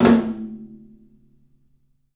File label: TOM, 3D Drums, CLUB TOM; 3D Sound; CLUB 08TOM